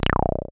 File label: SYN 303, Roland TB-303; Sound & Vision - Gigapack I CD 2